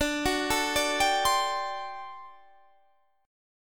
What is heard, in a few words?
D 11th D, down, Guitar, Standard; D11 arpeggiated; JGuitar